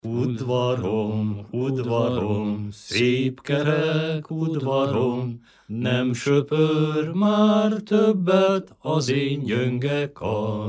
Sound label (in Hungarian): nepdal; udvarom udvarom; nepdalok